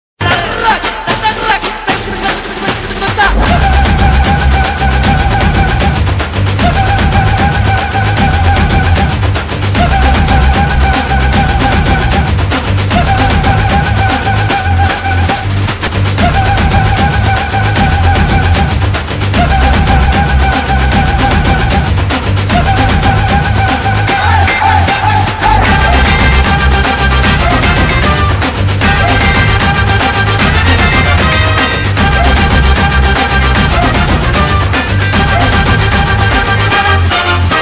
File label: naan sirithal bgm ringtone